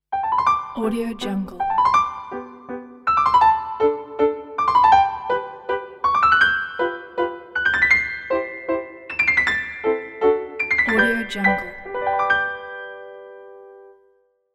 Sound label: playful classical piano ident; soundrosestudio